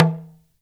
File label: TLK DRM, Heart of Africa; Spectrasonics